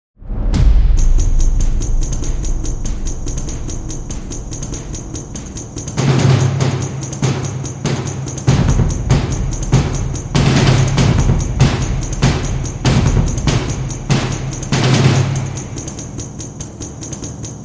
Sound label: drum track, cinematic drums